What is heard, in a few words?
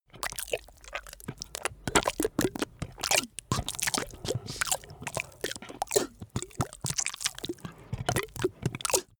monster flesh eating; comedy-cartoon; soundpool